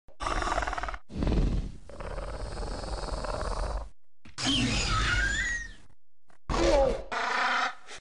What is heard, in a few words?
velociraptor roar; jurassic park